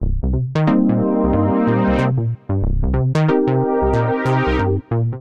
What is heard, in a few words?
Brass (Cm) 92bpm; Music Loops; Prophessor Cinco Brass; Ableton; Factory Packs; Singularities